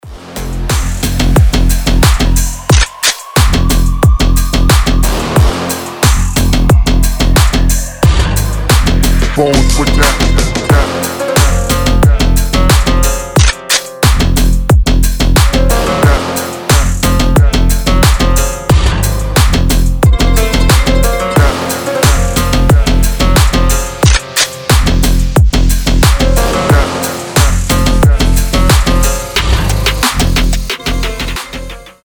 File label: brutal arabic